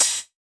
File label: hihat, Hardcore Breakbeat, 145 Ebmin; Big Fish Audio; Hardcore Breakbeat Action; Riddim